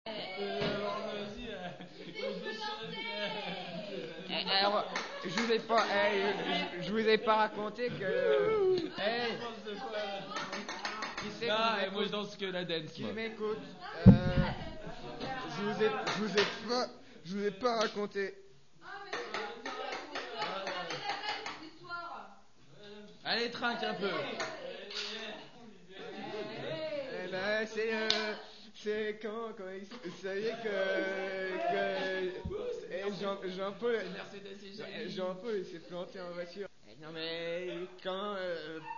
ambiance ivre

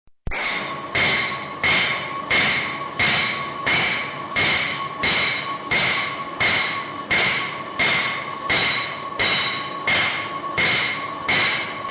battement martellage a empreintes

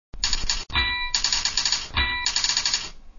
battement son clavier regie

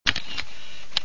texture appareil photo

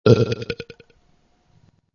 texture rot

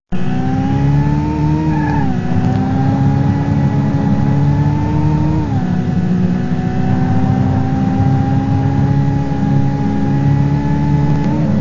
auto interieur acceleration

